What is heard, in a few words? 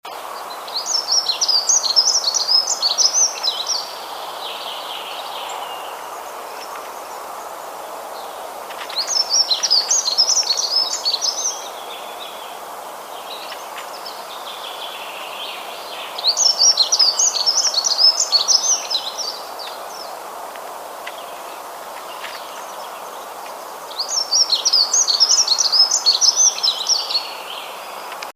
Prunella modularis